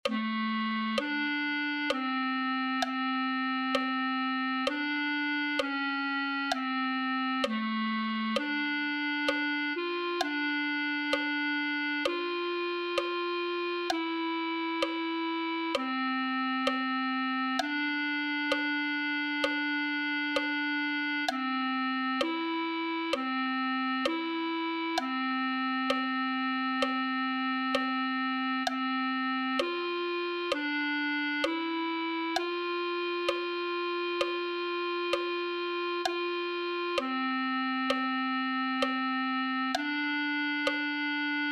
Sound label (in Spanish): clarinete